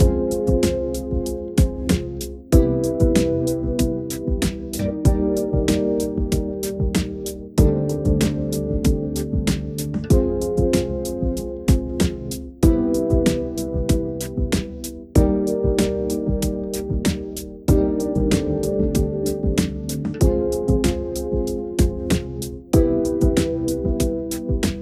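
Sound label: lo-fi groove 95 bpm; 40 sec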